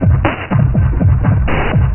Processed Perc; Sonic Foundry (Sony Creative Software); Electrocution; Beat Components

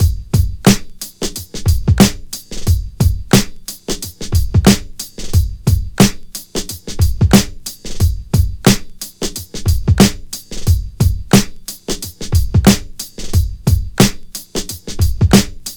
90 Simple Skool, Breaks; Lockdown Breaks

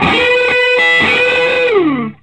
SOLO E, Vintage Blues Guitar

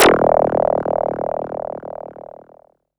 rip; One Shot FX; Sample Magic; Transmission-X

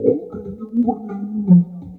Vocoded Drum Beat, Electronic Beats; Sonic Foundry (Sony Creative Software); Myths of Technology

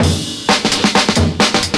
FILLS, 70_s Breakbeats

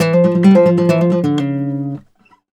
Flamenco, Guitar, a

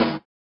SWUSH; Spectrasonics; Metamorphosis

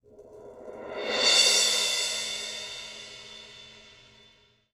Crash Roll, cymbals; Sonic Foundry (Sony Creative Software); Joe Vitale Latin Percussion

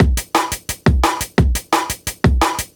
DNB BREAK

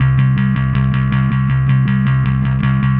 Happy Pub Bass; Sonic Foundry (Sony Creative Software); Indie Rock Anthems; Session 05 - Happy Pub

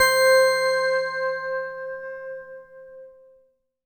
VS BELLI; Synclavier Sampler Library; KEYS AND GUITARS

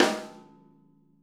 SNARE, Interactive Drum Kits

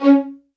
MED ATT C#4, soft violins; Q Up Arts; Denny Jaeger Master Violin Library

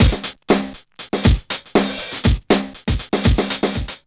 BONESHAKE 120, Funky Elements loops; Zero-G

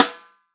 REAL DRUMS FUNK KIT

